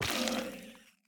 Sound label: Sculk Sensor break